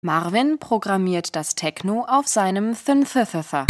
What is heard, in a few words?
synthesizer